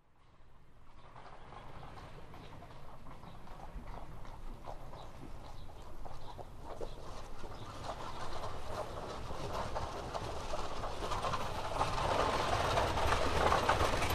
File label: Carriage By Fast Into